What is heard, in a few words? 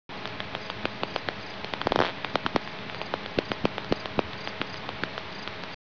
bat